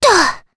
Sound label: Vox Landing, jp; Cleo; kingsraid